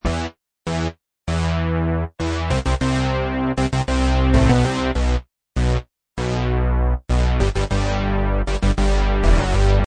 Saw Synth3 Loop Em 98Bpm, Saw Synth Loop In E Minor At 98Bpm; Synth Loops